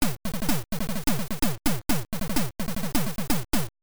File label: Get Thunder Drum; Link's Awakening DX